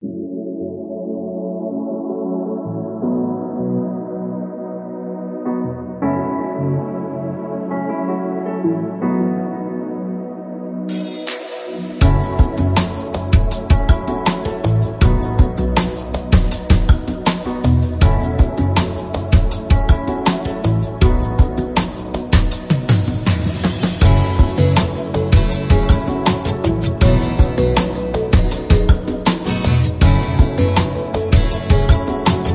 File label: Night jazz beats